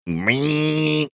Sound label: imitating his laptops fan